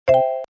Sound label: UI Re Trigger; Hot Spin; CQ9